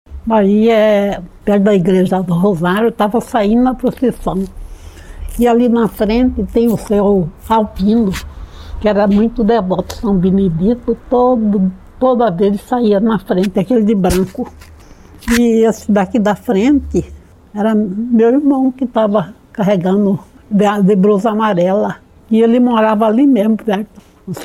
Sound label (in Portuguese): Procissao de Sao Benedito